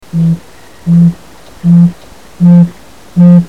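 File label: Japanese scops owl; ookonohazuku; toritori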